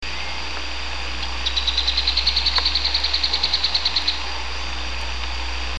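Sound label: Carpintero Oliva Chico, Little Woodpecker, Veniliornis passerinus; cantos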